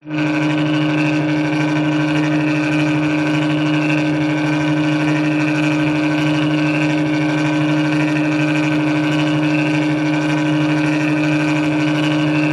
electronic typewriter paper feed motor whirr constant no paper; office; brother ax 450